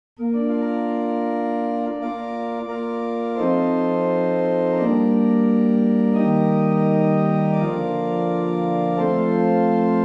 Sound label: barrel organ; Llanfair